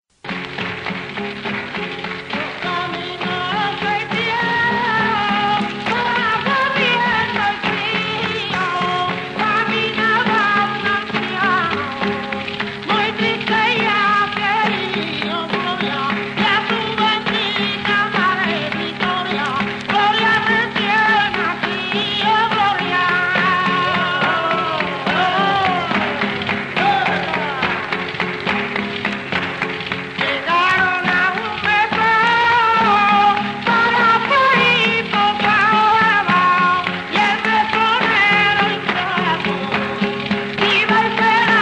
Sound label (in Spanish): Villancicos; GLORIA; Los caminos se hicieron al Cielo